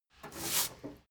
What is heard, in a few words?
mechanic sink short brush